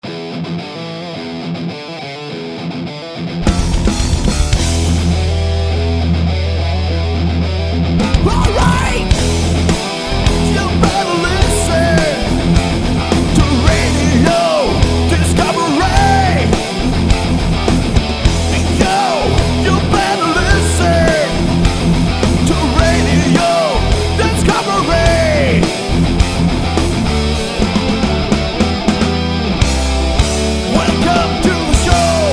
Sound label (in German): Jingel